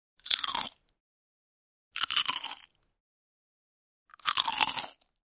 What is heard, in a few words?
chips sound